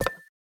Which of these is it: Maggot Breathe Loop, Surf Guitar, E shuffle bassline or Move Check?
Move Check